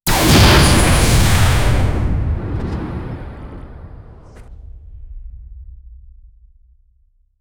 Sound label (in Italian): spari di fucile al plasma, science fiction